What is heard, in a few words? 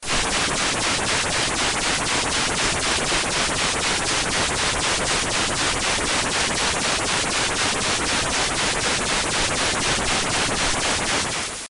Strange Static